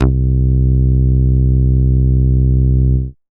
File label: Moog Bass, Dance Synths